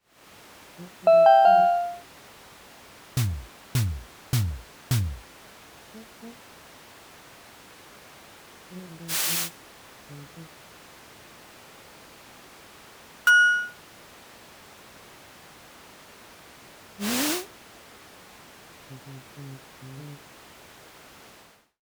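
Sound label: ambience store small shop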